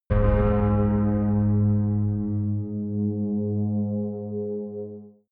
synth hit; whooshes rises

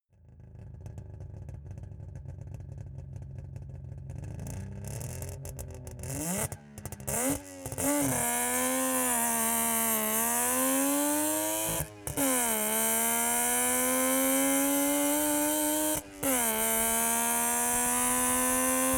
car saab 92 drive exhaust

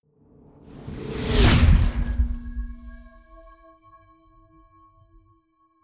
magic warp personal jump; swooshes-swishes

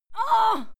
female fight moan pain heavy

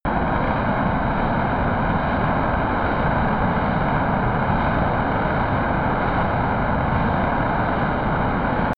military jet b-1b lancer turbine steady distant; verkehr transport; Airplanes - Jets

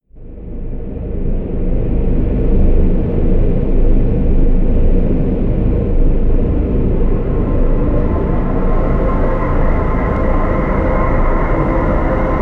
drone cool

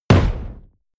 fire burst dry low; whooshes rises; hits impacts

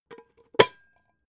pot lid close plop; objekte; topf

